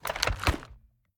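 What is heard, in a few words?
sniper inspect end; gmod; iw8; xmike109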